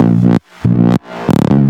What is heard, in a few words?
Loops Fills; Vengeance Samples